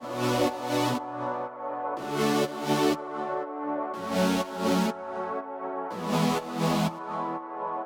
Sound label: Synths Crispy Horizon Fade In 122 Bpm A major Loop; Artlist Original